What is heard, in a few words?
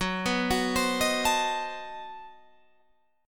B 7th Flat 9th, guitar